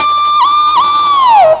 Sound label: bended strat